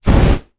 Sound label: Flame Thrower Burst; Rust; DJ-Tools